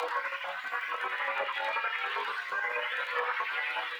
Tonal Re Draw, sparse soundscape

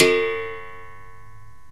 BERIMBAU; OMI - Universe of Sounds

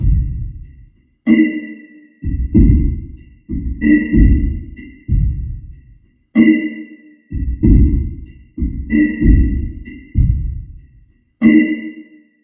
94 REVERB, Dark Side Of TripHop; 94 REVERBS

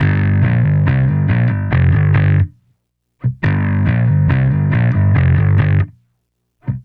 Bass

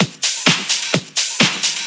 Discohouse Loop 128 BPM

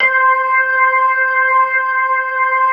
HAMMOND; Jazzistic

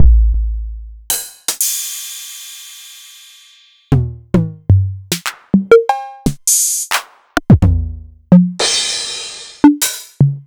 Novation Drumstation

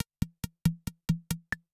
percussion loops, Techno; PS-45 WAV Techno ID

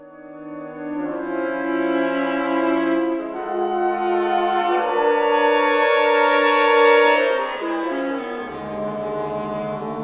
FULL ORCA I; East West; Symphonic Adventures

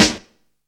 little, snares; Zero-G - Funky Elements

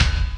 CRAK BD, Industrial; BD KIT; Industrial Loops

